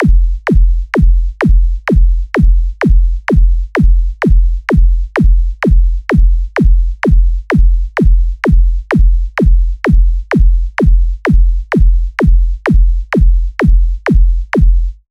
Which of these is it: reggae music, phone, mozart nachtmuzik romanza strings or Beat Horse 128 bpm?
Beat Horse 128 bpm